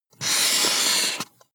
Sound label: marker mark hard long